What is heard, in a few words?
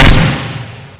plasma shot fire